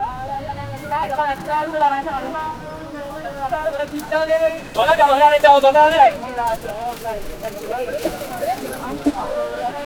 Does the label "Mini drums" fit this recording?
No